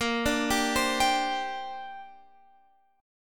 Bb 6th Add 9th Bb

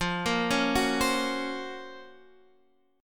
Gb Major Sharp 11th F, guitar

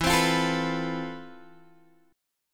F Major Sharp 11th, E

